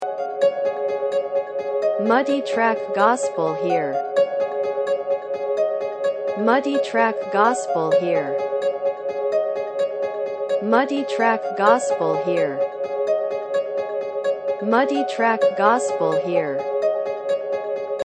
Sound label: Piano FX